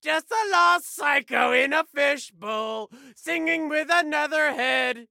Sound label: singing; Borderlands 2